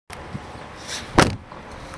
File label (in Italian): sportello clio suono